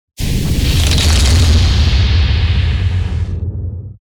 Fire element spell

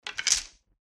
Grab arrow

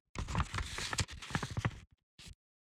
MOVMENTS paper

